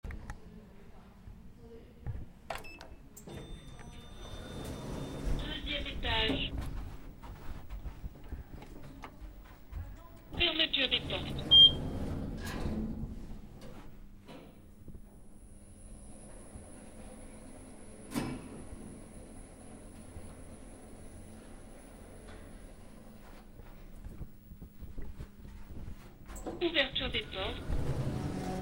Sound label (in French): BRUIT ASCENSEUR 2EME ETAGE